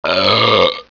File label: burp